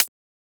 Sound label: Closed Hat; FLPRO